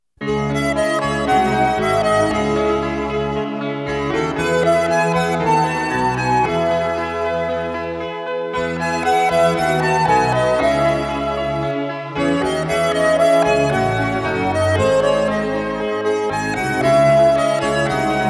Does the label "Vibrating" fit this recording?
No